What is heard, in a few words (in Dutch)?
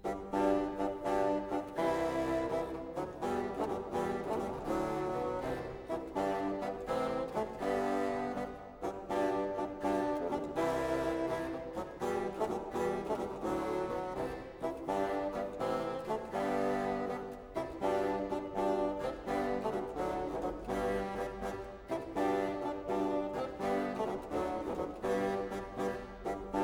Fagot; Philip Pickett; Susato